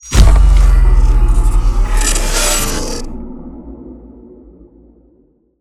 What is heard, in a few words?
bass drop